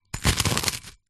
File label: food biscuit crush on plate